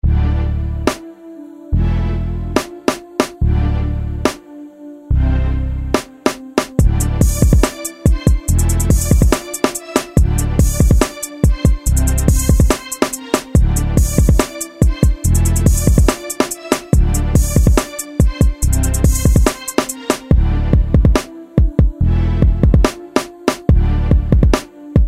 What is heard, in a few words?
base instrumental; fl studio